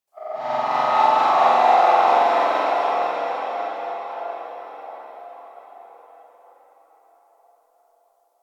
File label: Guild Mid Texture; Ambience; Warframe